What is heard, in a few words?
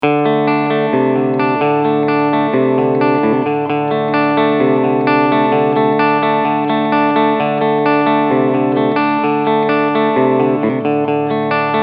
Cleansound